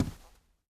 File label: carpet, Footsteps